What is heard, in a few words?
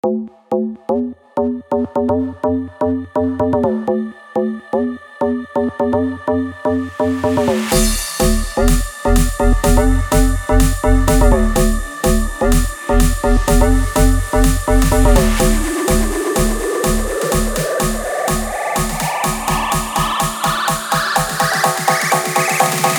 Future House; Future Series